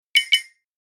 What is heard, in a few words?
Cartoon eye blink